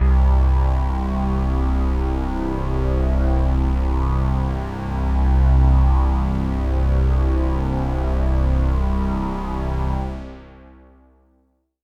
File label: Pad C, Synth Prophet One Shots; Electronisounds